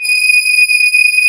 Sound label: CMI ORGAN